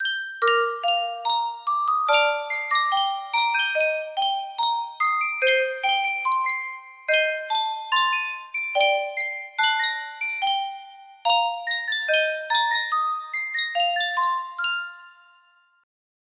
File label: sankyo custom tune music box, music box melody